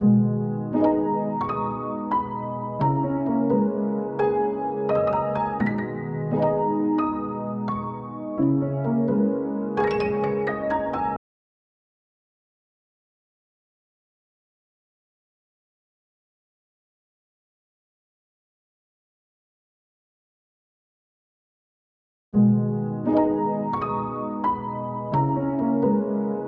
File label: 86 BPM G# Min Keys, melody loop stem; Cymatics; 2022 Melody Collection; Mafioso